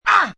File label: Pain Raspy Ah, Pain, male, short; VoiceBox